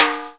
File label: Snare Shell Layer; Kick Snare Layers; Drum Bits; layering